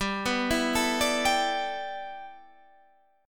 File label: A 7th Suspended 2nd Suspended 4th